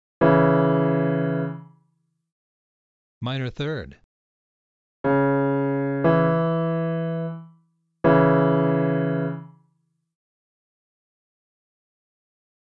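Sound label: minor 3rd major 3rd harmonic; Interval Recognition 1; Training Exercise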